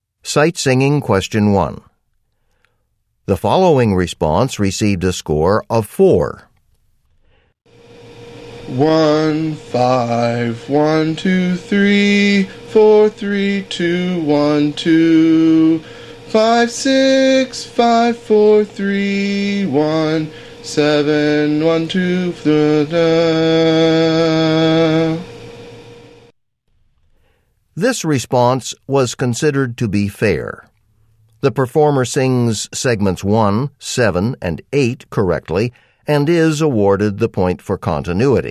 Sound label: music sample